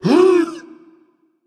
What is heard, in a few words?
breath; smite; thor